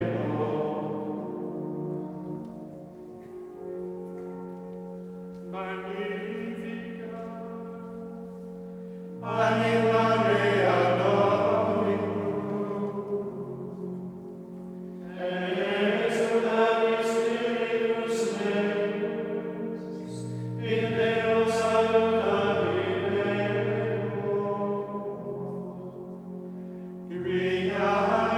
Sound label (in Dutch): Koor mannen Gregoriaans; Magnificat